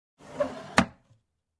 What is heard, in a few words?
Small Sliding window close